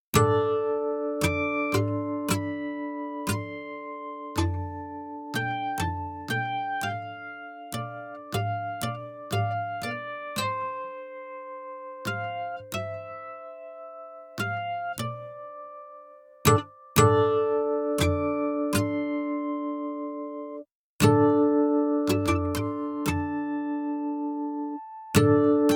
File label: dry; Halls of Fame 3 von Best Service